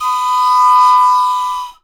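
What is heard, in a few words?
tri tone whistle; Voice Crystal - Steve Reid Definitive Percussion; ASRT WHISTLE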